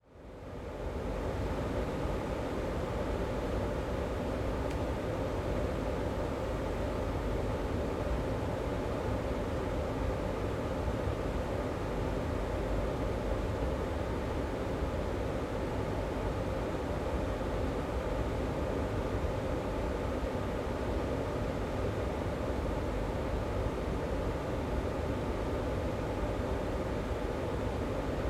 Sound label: Train Interior Ambience